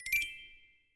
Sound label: notify tiny; pond5